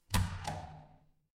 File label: door open